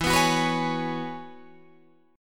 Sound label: E 6th Add 9th E down Guitar Drop D